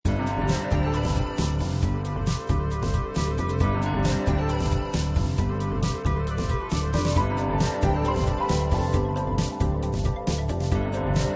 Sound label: Battle Main Bgm; doraemon gadget rush android ios mobile gamerip 2015